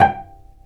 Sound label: Vcs Pz dry; STR Vcs Marc-Piz